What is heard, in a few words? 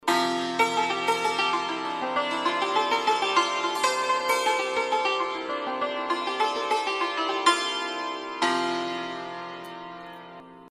santoor audio sample felted hammers